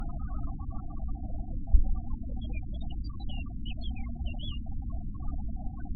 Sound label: Male Blackcap